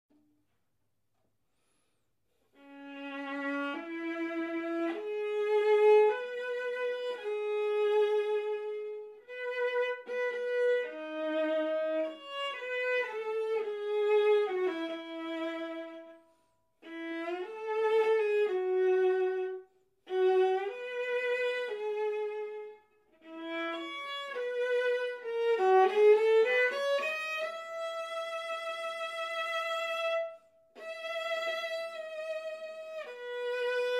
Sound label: Viola; Berlioz